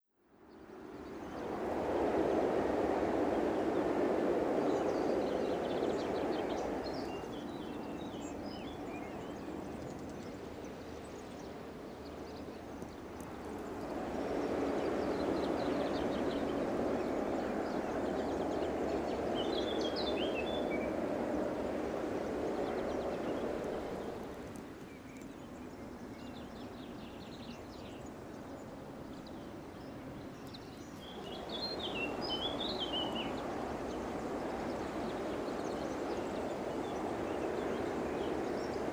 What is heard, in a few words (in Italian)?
Calliano campane; Portobeseno Suoni Ambientali; Alta Vallagarina